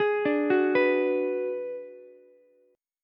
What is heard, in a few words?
Ab Minor 7th Flat 5th Ab, down, Ukulele; arpeggiated; JGuitar